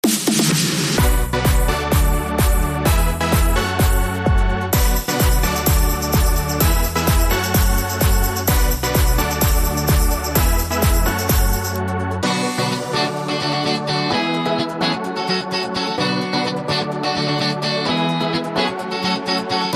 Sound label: Electro Pop Diva